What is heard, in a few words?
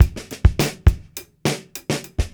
Beat Loops; Zero-G; Monster Beats